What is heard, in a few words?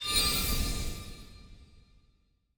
halo grow